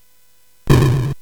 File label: Dark Castle, CSG8580R5